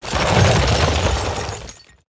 Quartz Elemental Attack

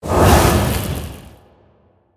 Tri Spell Underlay Death

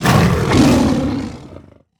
Sabretusk Play

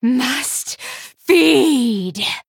female blood elf, Attack

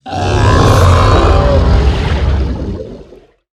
Death Sound

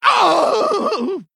Badlands Brawler Ogre Emote Death

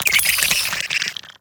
Golden Scarab Attack

